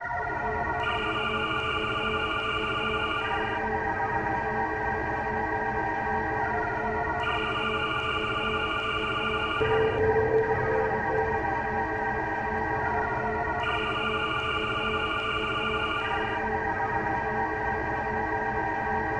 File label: Ambient; Temple Dojo A; LSD Dream Emulator; ps1